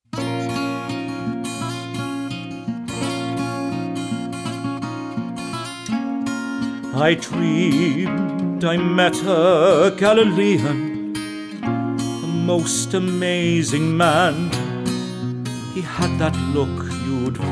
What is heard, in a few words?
Pilates Dream Singing; voice